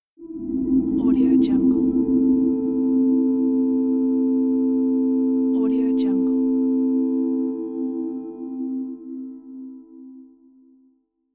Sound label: cinematic pads